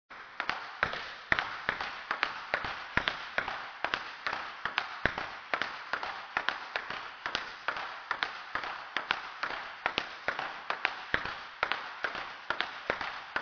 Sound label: Ping pong pros; table tennis